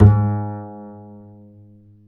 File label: G#3, Bass; Spectrasonics; Bass Legends